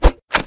mp5 mag drop

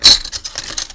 key pick up

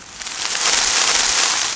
rustle

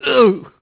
zombie pain, male

